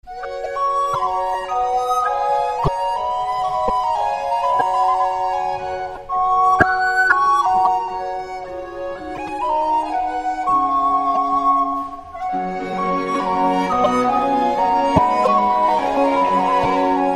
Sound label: flute; PURCELL; King Arthur